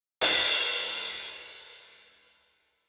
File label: Cymbals